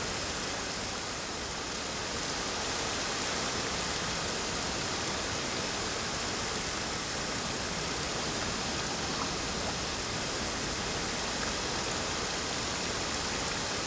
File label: ambience field night breeze rainy; Rust